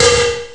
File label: mega hall tom; Amiga Soundtracker Sample Packs